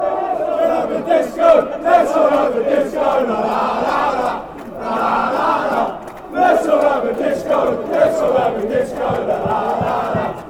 lets all have disco, fan chants; stevenage

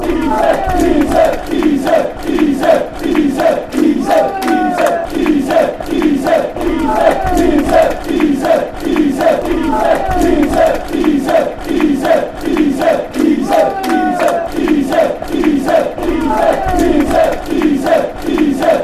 easy easy easy easy, fan chants; bwfc

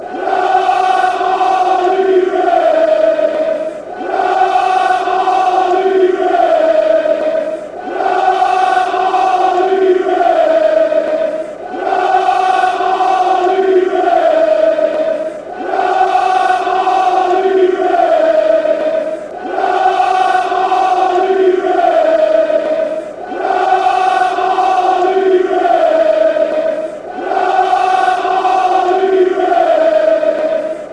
come on you reds, fan chants

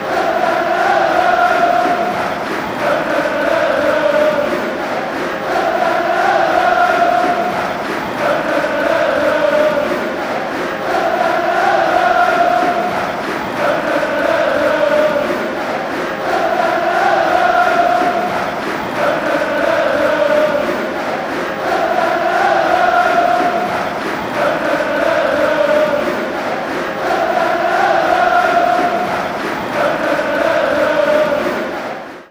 oh oh oh oh oh

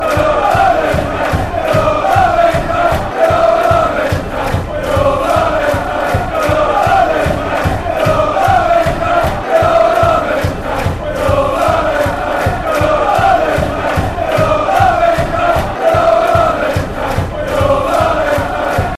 we all love, fan chants

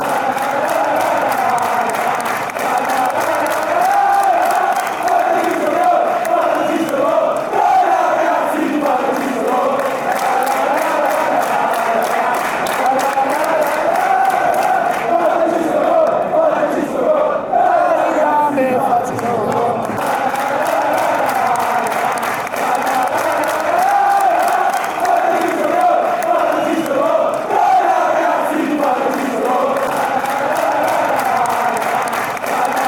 goal, fan chants

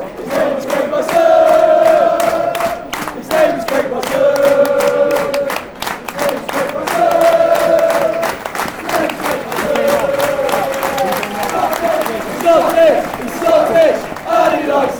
scottish, fan chants; craig bryson

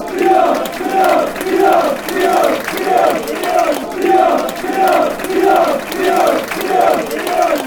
chant; everton

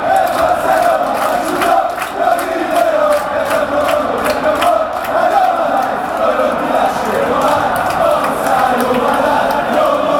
score a goal, fan chants

